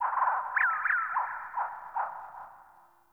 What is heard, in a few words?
Birds Low, Ambience Plains Landscape; Warframe; Eidolon Plains